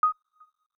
widget icon click, Samsung system; Samsung SCH-M710 T Omnia II

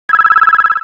Ring; Generic3; HTC Touch Diamond

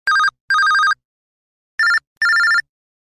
Simple Beep; LG; S367

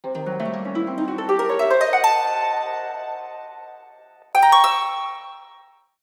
harp diminished; HTC One-X; ringtones

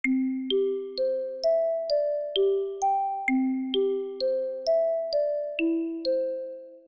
Class Electronic, notifications

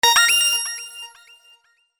Three Up Notification, Synth; HTC; Message

